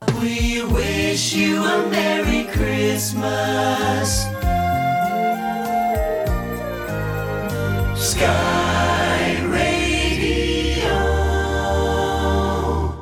omroep jingles

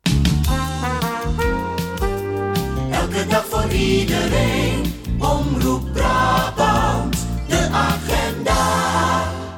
omroep jingles

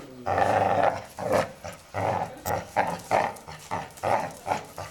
hond grommen; sound effect; Home-made; dieren

